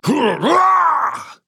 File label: falling; Paladins; Atlas